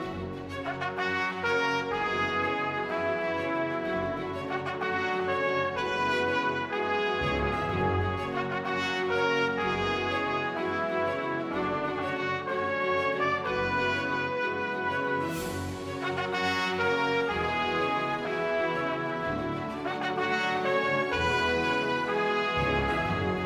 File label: Music Ingame Fanfare Loop